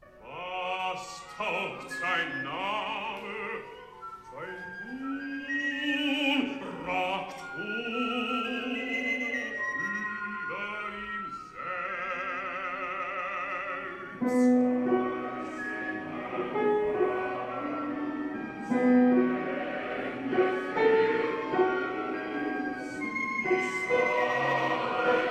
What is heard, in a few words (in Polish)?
soprany; cardillac